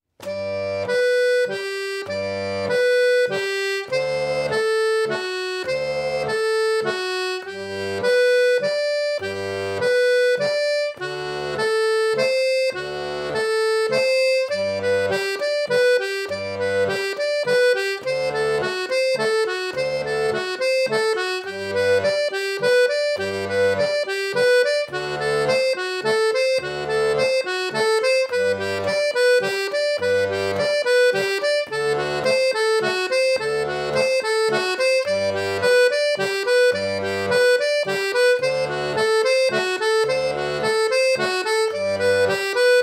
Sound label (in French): arpeges; 6/8